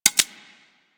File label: CY Xbow 2D Mech A; Tenno